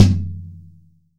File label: 15 INCH KICK